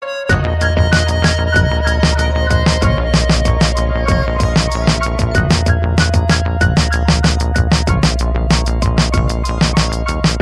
Hip-Hop

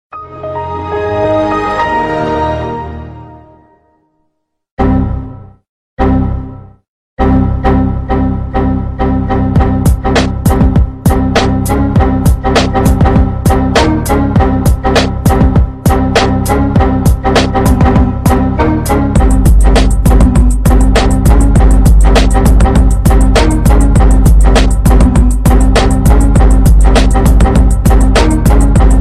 Xp Tone

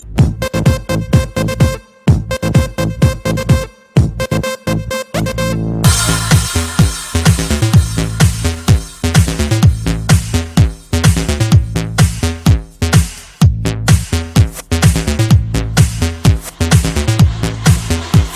disco

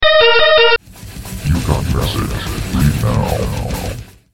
Evil Message

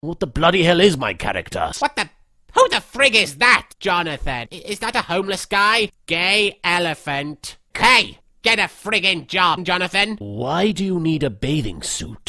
Anime Song

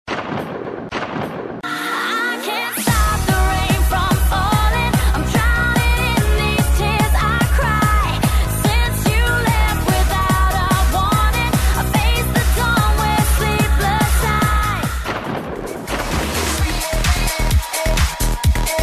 Rain Remix

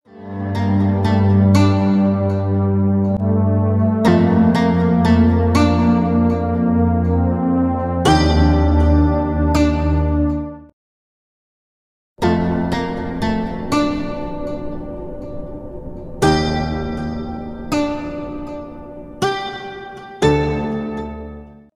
Chill Out Sms Sound

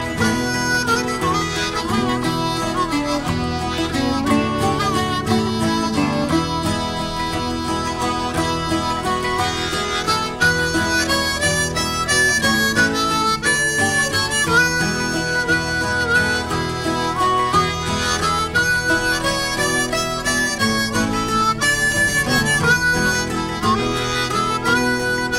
Irish Mouth Organ

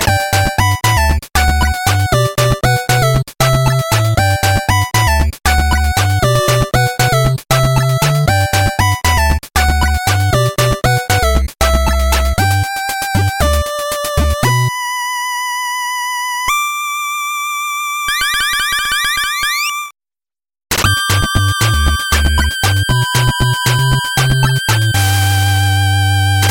8bit Ghosties

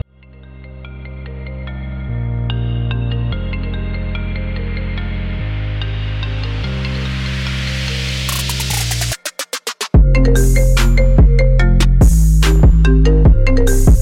IPHONE TRAP RINGTONE

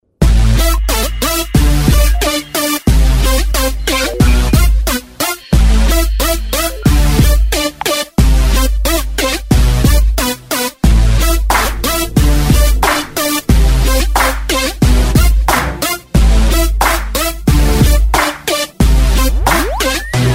Bass

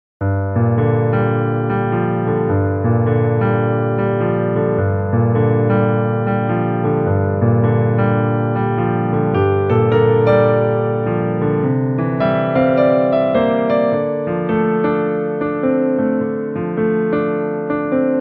Piano

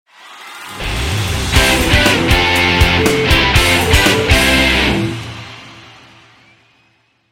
Guitar

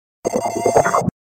Funk

